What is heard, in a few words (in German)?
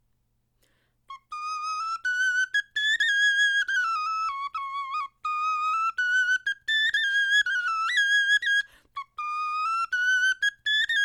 melodie